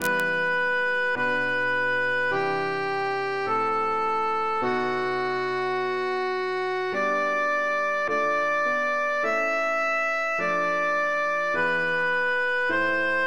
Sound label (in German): sopran